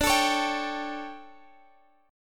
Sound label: D Major 7th Suspended 4th, chord; DM7sus4 strummed